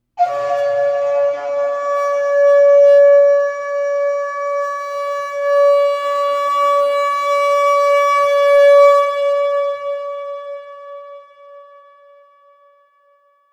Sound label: kudu high; Sunrise Ceremony